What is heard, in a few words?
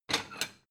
plate small take slide hard